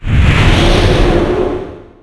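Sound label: SHOCKWAVE; Shadow Master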